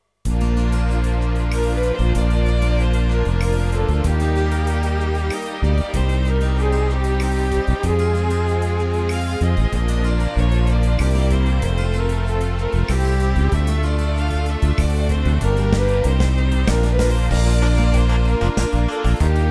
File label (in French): menuet; octobre